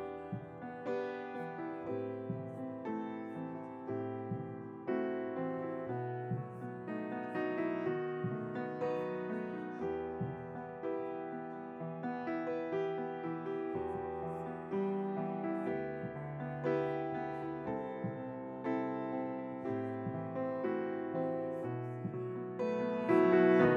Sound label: piano